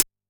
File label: hat, drums